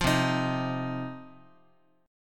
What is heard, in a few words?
C Minor Double Flat 5th C down Guitar 4A 4E 5A 5D 5G sharp 6C sharp; Cmbb5 strummed; JGuitar